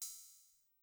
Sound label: Cym; Sonic-Potions LXR; FatMed